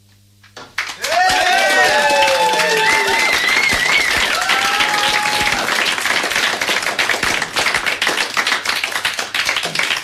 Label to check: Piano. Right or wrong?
Wrong